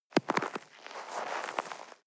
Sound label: step snow walk single; natur-tiere; schnee